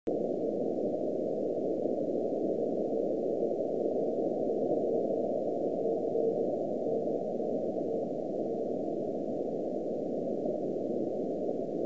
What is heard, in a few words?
Noise